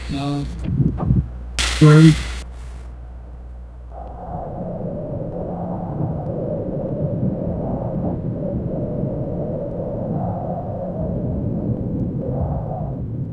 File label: distant muffled shouts